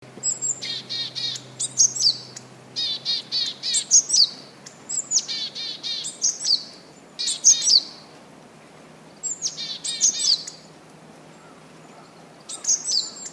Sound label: kogara; コガラ; ♪地鳴き♪; はニィニィとヤマガラに似た声です。３月に八ヶ岳のふもとで聞いた ものですが、この時は２羽のコガラがすぐ近くでニィニィ 、ツツチーツツチー と それぞれ鳴いていました。